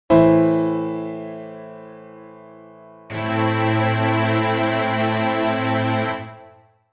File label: a minor chord